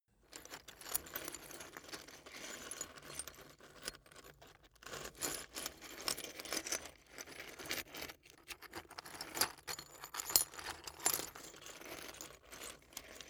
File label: glass debris scrunch sequence; objekte